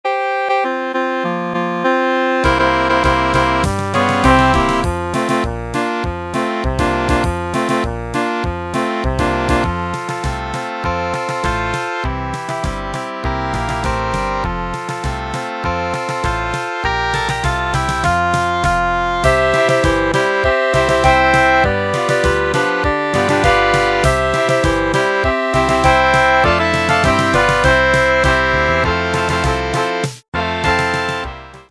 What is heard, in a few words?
Square Dance for Band